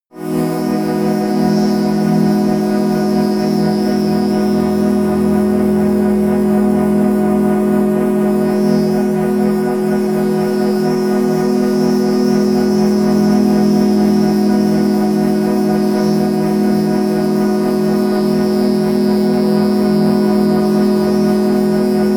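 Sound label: chill air, pads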